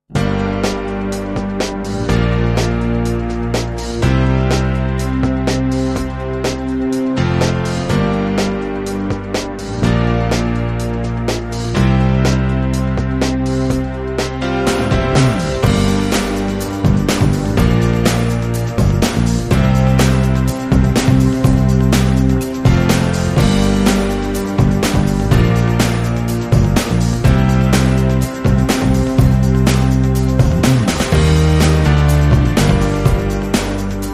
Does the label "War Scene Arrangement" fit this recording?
No